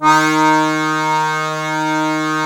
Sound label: DIATO1 D#2, Historical Instruments; USB Soundscan vol.23